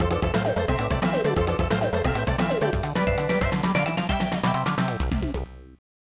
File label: Round Clear; Waku Waku Ski Wonder Shoot; SNES